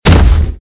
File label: hardwood fall; Golden Crayon Sounds